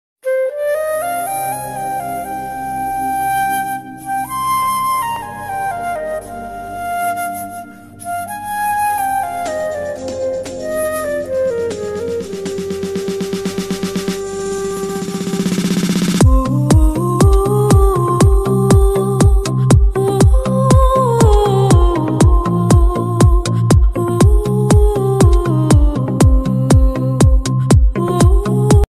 broken heart touching bgm ringtone, telugu love bgm ringtone